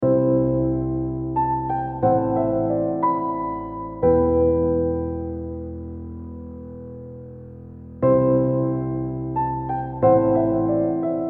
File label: Emotional Piano and Strings